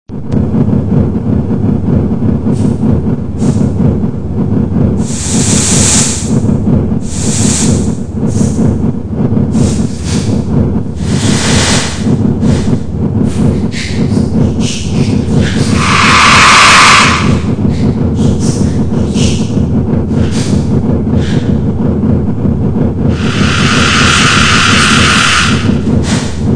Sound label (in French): Bruits de zone